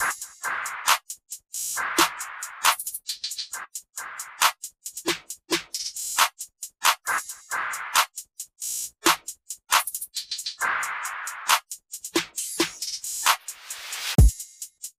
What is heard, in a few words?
beat